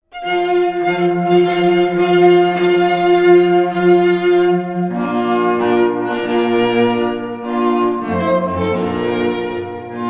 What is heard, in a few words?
March; Wagner